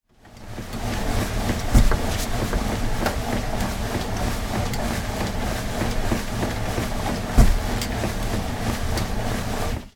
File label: sport person exercising on exercise bike